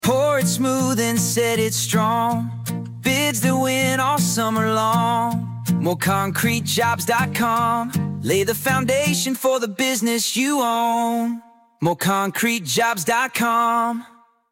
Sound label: jingle